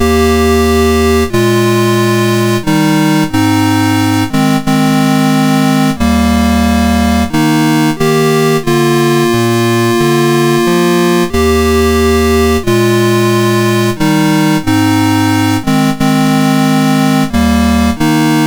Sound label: Nearer my God to thee, MOS6581R2